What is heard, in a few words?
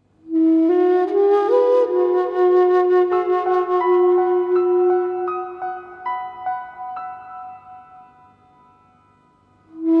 Anasazi endblown in E